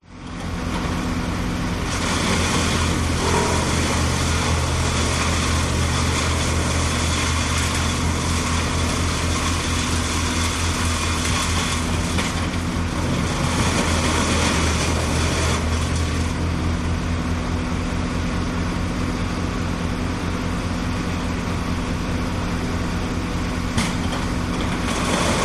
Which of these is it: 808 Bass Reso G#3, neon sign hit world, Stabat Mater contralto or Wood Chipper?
Wood Chipper